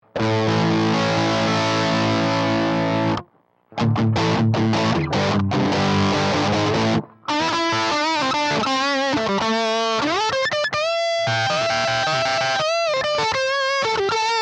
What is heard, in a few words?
Greco Dist